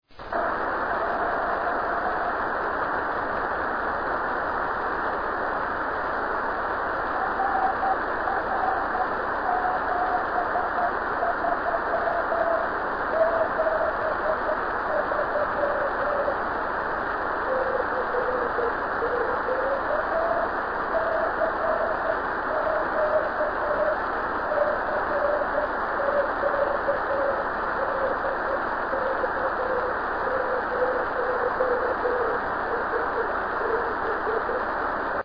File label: EME 10GHz, CW; Bochum Event; 3cm; DK0SB